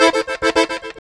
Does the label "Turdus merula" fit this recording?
No